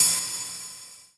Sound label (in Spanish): golpe platillo